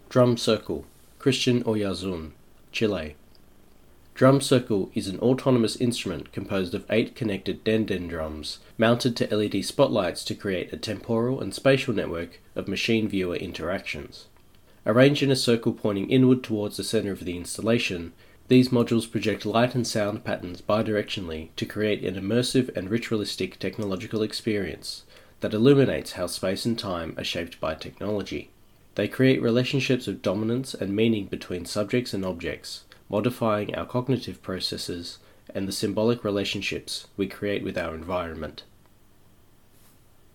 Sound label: drum Circle; eng